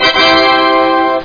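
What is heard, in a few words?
sound of fanfare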